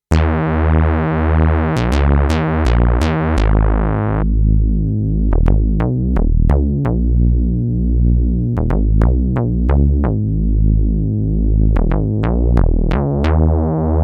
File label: Dominion X Bass, synthesizer